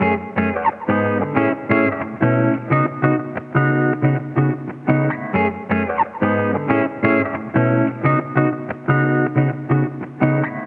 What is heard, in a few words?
Electric Guitar 90 BPM C# Min, Dry; Melodics; Cymatics; Eternity Sample Pack; Guitars - Electric